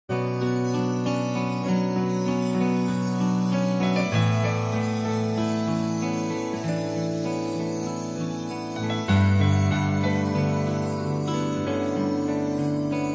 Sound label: Concert version; Delta